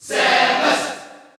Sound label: Cheer Dutch; Samus; SSBU